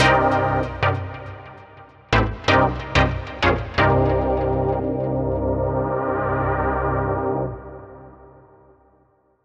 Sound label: cheesy chord; best service nitron